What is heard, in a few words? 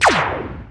synth laser